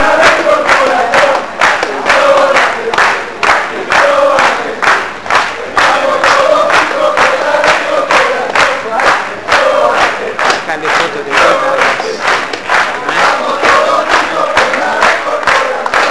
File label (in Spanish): canto